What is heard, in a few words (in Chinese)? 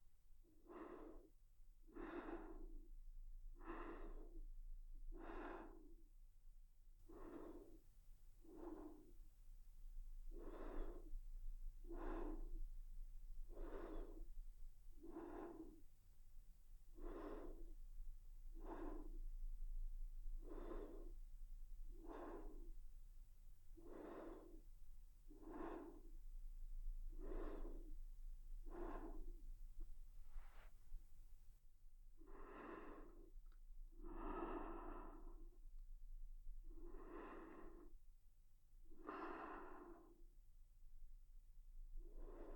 寝息; 宠溺会所花麒麟; 无效果音